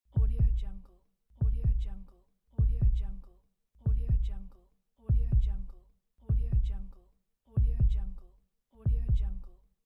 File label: heart